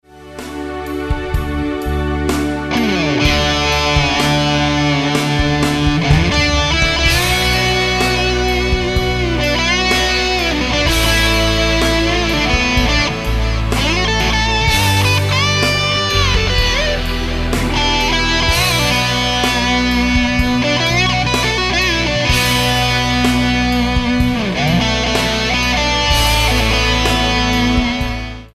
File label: solo